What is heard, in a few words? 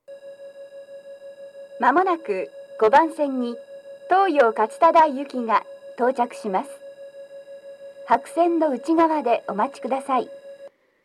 nishi funabashi toyo katsutadai, station